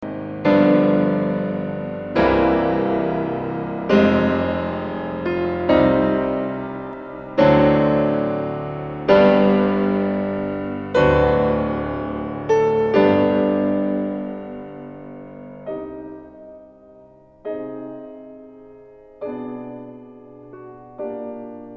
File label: Prelude in C Minor op.28 no. 20